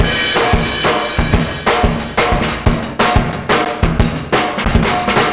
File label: Fast n' Bulbus 180 bpm, breaks; Zero-G Beneath the Planet of the Breaks